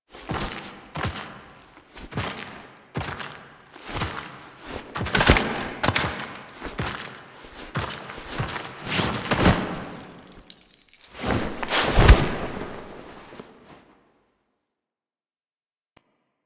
Foley; Warframe; The New War; Grineer Eidolon Ending

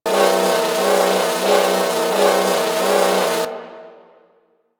Space Drone Loop; Grineer; Vehicles

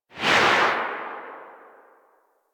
Atmosphere Flyby Right, Vehicles Movement; Warframe; Archwing

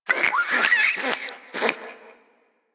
Venus Landscape Close Critter; Ambience; Warframe; Venus Open World

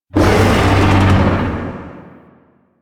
Void Dragon Vocal Pain; Enemies; Vocals; Warframe; Duviri

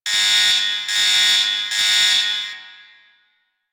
Elevator Alarm Loop; Warframe; The New War; Veso Intro

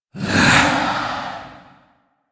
Rell Specter Vocal Attack; Warframe; Priest Quest

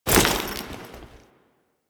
Foley Step; Warframe; Kahl

